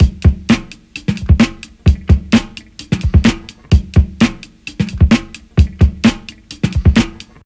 129 bpm drum loop c sharp key

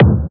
present kick drum sound d sharp key

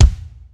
crisp steel kick drum sound d key

